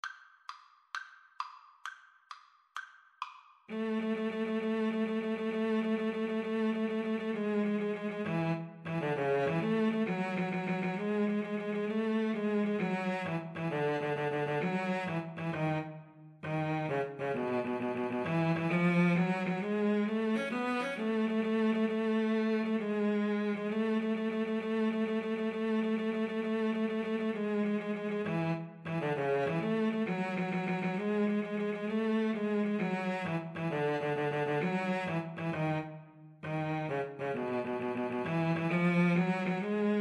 mendelssohn italian duet, Violin-Cello Duet

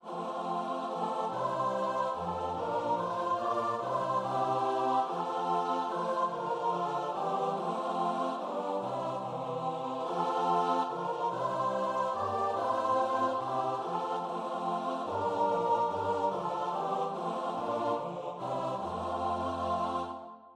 while their flocks, choir

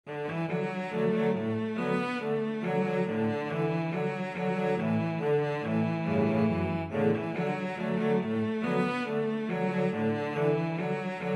cello quartet

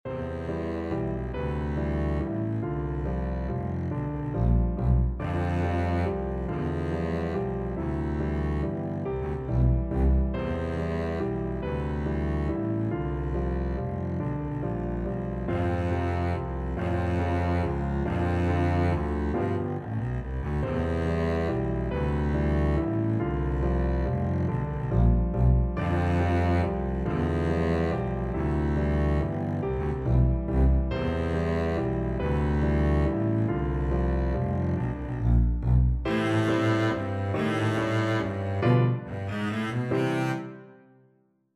tchaikovsky flower waltz, Double Bass

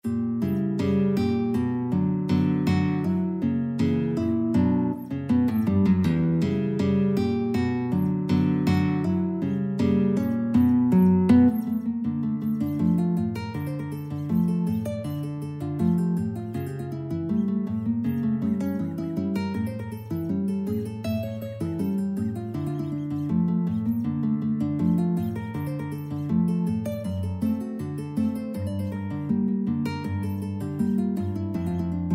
guitar ensemble

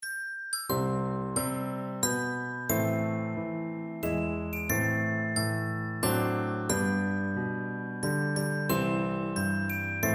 GL

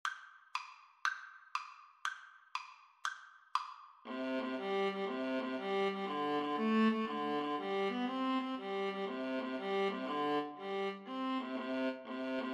irish jig medley viola duet

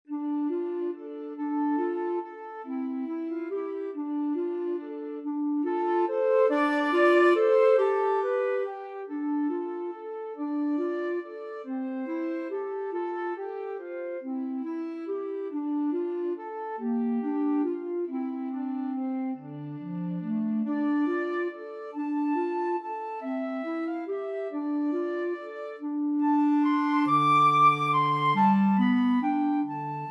scarborough fair, flute clarinet duet

